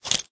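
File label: inventory items grenade; STALKER; IWP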